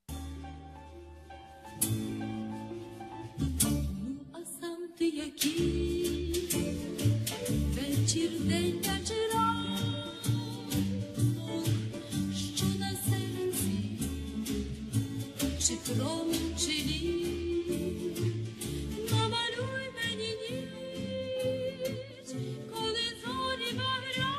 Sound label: Ukrainian tango